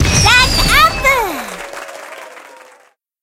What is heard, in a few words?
rankup fan; line bubble